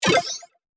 boost

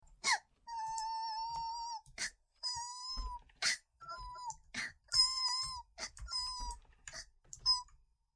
a puppy cry; korone; vtuber

